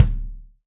Kick Session Dry Felt Hard

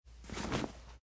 shoulder bag pickup

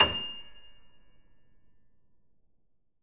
Grand Piano F6 mf

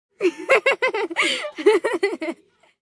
young girl laugh

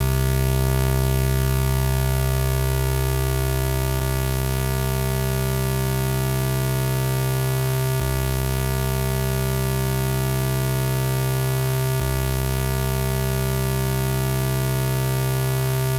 Dual Osc Reso Noise Bass

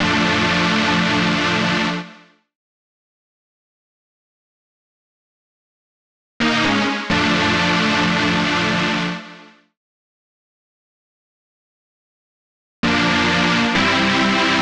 Fat Pad

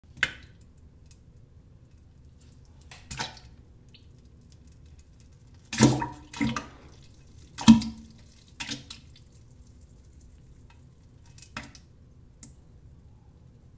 Poop shit splash sound effect

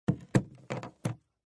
case set down

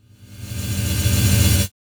Corporate Ringing; TransitionsFX; НАБОР DSLR КИНЕМАТОГРАФИСТА

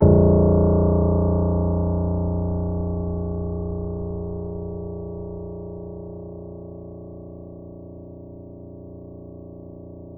Grand Piano A#-1 p; Ableton Live 9 Essential Training

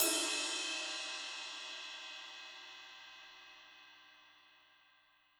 Ride Ambient Loud Punch Stick Mid Medium

